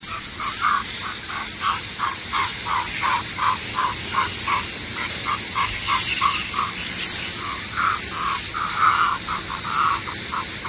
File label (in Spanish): garzo